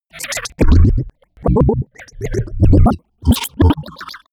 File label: robot defect droid